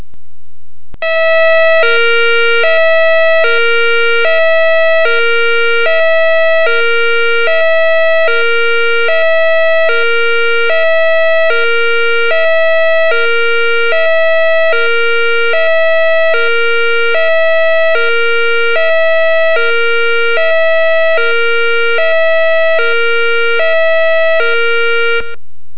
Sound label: evacuation alarm whelan hi lo tone; lyondellbasell